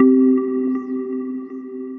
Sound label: Kalimba; Mallets